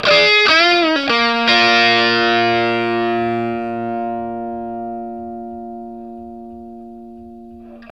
Vintage Blues Guitar; USB Soundscan vol.22; AKAI

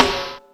Distort, Electric Snare; Yamaha DTXpress

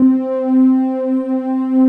JUP STRING; Pro-Rec - Synthsonic; JV ANALOG2

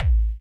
FRED, DRUMS PERC; Q Up Arts; Dream Experience

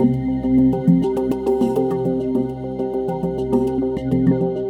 World Atmo 102, Percussion Combos; M-Audio; Pro Sessions VOL-38; Abstract World Fusion 2

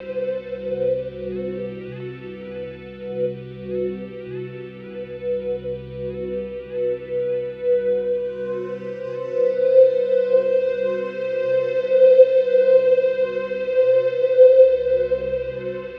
Guitar Textures

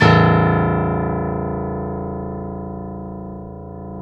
KEY, Steinway ff; Steinway M

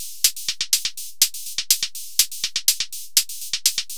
hihat; Music Store Sound Service; Einstein

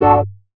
chords

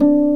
BOTTLES; OMI - Universe of Sounds

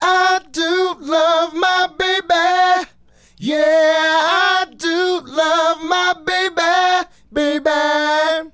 Male Group, Hip-Hop and RnB Vocals

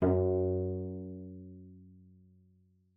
cello pizz F#2; 90 s Sample CDs; Xsample VOL-5

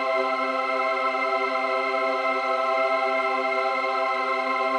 Pad Loop C, shimmer and sparkle

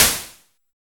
NOISE SNARE; TEK PERC2

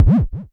Klone Dual Percussion Synthesiser tom; KLONE FX NW8

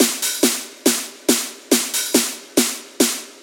Loops 140 BPM Hihat Percussion Loops

